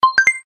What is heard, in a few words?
button; Santa Run